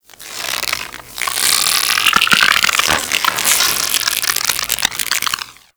Shock Swarm Projectile Random; Warframe; Infested; Shock Swarm Rifle

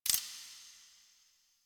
Choice Hover B; DE; Warframe; Winematic

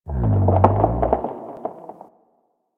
Landscape Mushroom Creak, Ambience

